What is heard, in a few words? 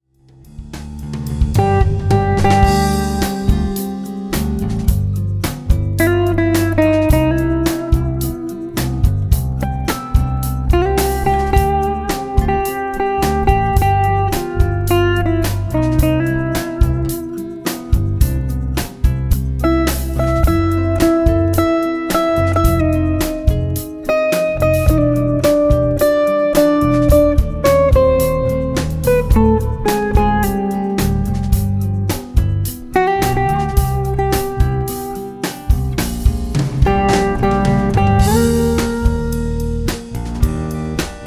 Dinner Jazz